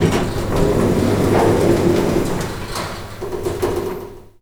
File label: elevator door open; soundscrate